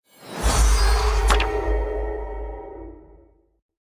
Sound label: ui talent open